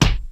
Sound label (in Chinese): 像撞到墙壁一样; 环境音; 非循环音(SE); Eustia